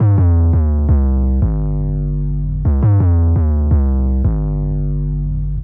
Hammer Bass, Drum & Bass; Fluid Dynamics

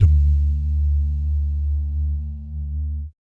BS DUMM, Vocal Planet Jazz & FX; ILIO; BASS DUMMS

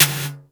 BG TRASH, METALIC DRM; Crypton - DrumBase5000; METALIC DRM2

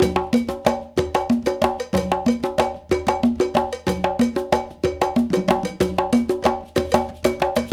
Bembe 6 8, World Dance; Spectrasonics; Supreme Beats

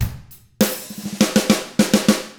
ROCK, Drums; ILIO; Double Platinum Drums 1

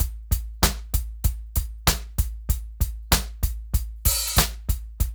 DRUMS, Funky Ass Loops

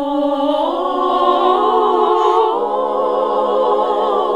SING, CLASSIC VOX1; Best Service; Hallelujah

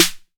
808 SD Tape, Snare Drum; Goldbaby Tape808 & Tape909

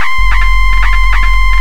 FXBEAT

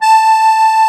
HOHNER, akkordeon

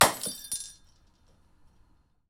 Bulb Smash; neuro; InsideInfo; Real World Sounds

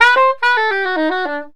SAX, Balkanish Traditions

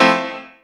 house dit, Stabs; E Lab; XTc Files of House

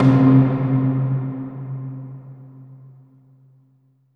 HOLLOW PIP, Impacts, Hits & Booms; Distored Reality

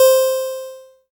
ELKA, String Machines; ELKA RHAP

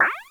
Drums, Hits; DCM8

